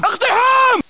arab scream

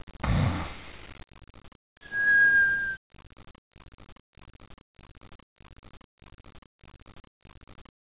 snore

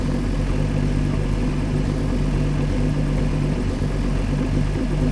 jeep

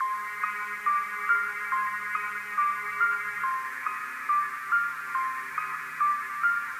radio

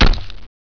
bullet hit

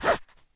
machete miss

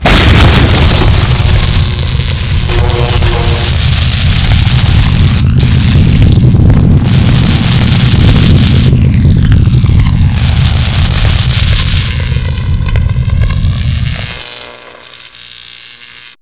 ball fly, weapons

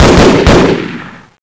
burst

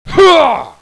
shout

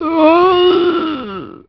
zombie idle, male

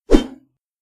sword swing; ragemap2024; grunt

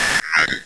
drone fly ready, weapons

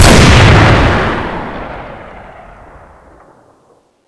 barret

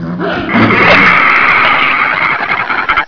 zombies raid

